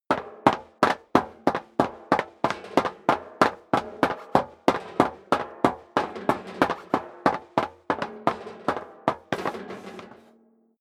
step sneaker metal rattle run sequence; schritte

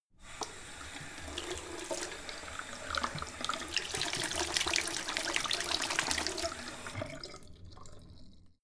sink medium flow wash turn off on; haus garten; badezimmer

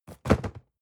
cardboard box medium fall; objekte

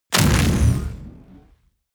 sci-fi crushing burst

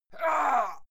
male fight pain short; schreie; menschen; soundpool

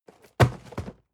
cardboard box medium fall high hard; objekte

